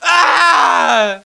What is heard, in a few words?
death; SINDEMO